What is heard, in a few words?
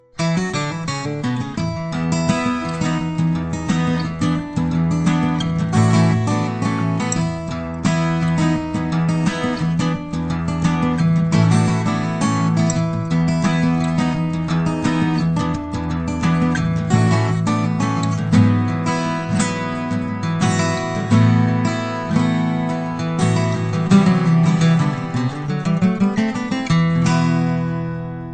acoustic blues